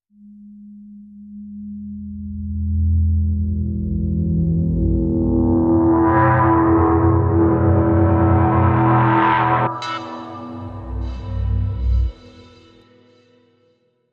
Sting

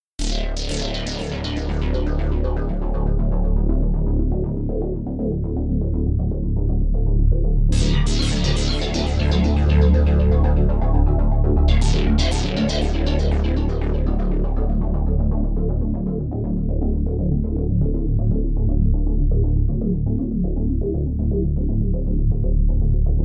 Dark Techno

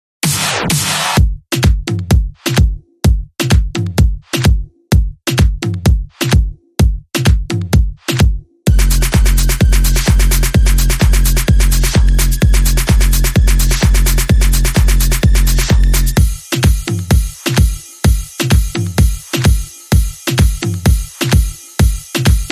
Beat

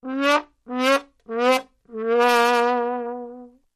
Trombone Comic Wah Wah Wah

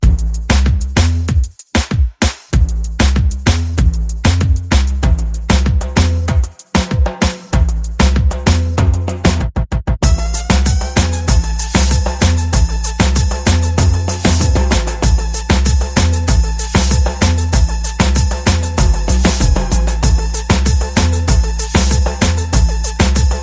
No Vocals

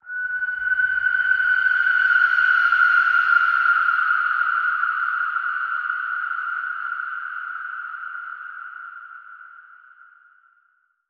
Breathless Whistle Bend

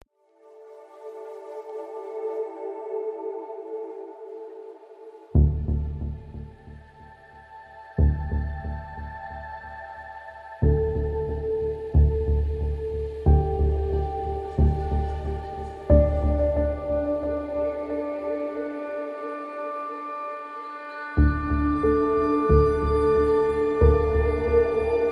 Delay Attack, No Arpeggio Mix

